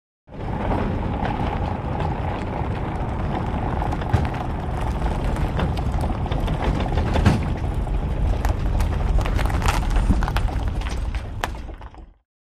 Taylorcraft Engine

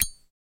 Zippo Lighter Open; lighters & matches

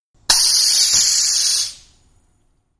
Air Release Valve

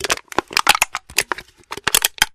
Aluminum Can Crush

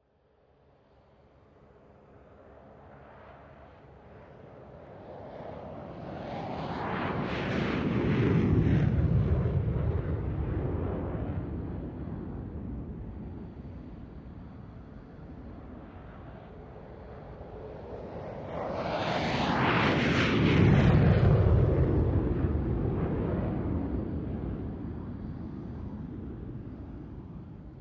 F4 Phantom Takeoff, f-4 phantom

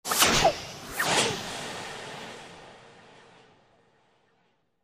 Baseball Arm Whoosh; baseball fx & games

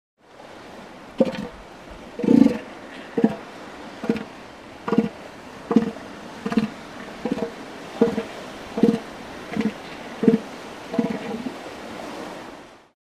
Seal Elephant Growl

